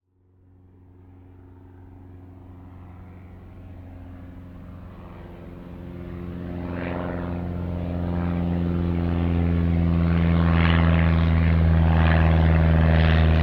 transport aircraft pitts s1 special acrobatics close by airshow